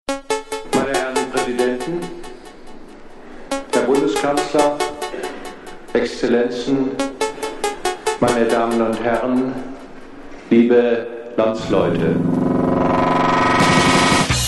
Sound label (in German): wahl jingle